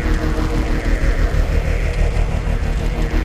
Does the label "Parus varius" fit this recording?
No